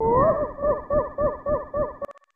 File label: Laugh; Trinea; SNES; Yayoi's Laugh